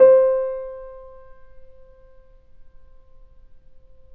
PP C 4, Grand Piano off mic; AKAI S6000 CD-ROM - Volume 2

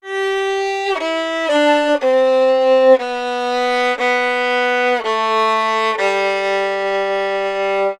Fiddle, Appalachian

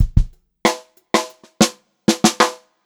groovy fill, Funk-Groove Drumloops; USB Soundscan vol.38; AKAI

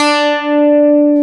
KEY FM, FM Clavi; Club-50 - Foundations Roland; Clavinets

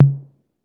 DRM 808 TO, Drum Machine; Roland L-CD701; Cheese menus

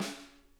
SNARE, Drum Kits

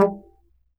D STRING UPRIGHT BASS, Upright Acoustic Bass

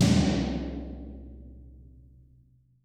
toms, Real Mega Drums

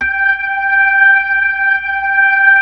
B-3 Chorus

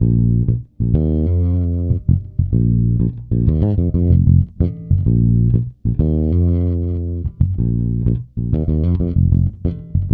A#, funk, bass; Spectrasonics; Bass Legends

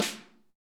SN, Real Mega Drums

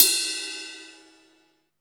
VELO RIDE, CYMBALS; CYMBALS 1

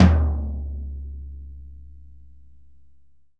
Floor Tom f; Digital Sound Factory

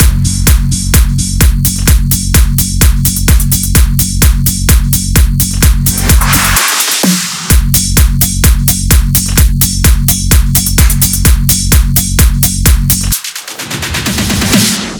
Drum Loop 128BPM FULL f#; Vengeance - Essential Clubsounds Vol.5; VEC5 Drumloops

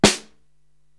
SD Paper, Korg TR-Rack drum machine

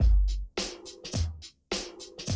Classic DrumLoops

